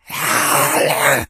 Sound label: hiss, infected; npc; alert; Left 4 Dead 2; Source Engine